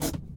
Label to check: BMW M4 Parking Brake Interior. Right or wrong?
Right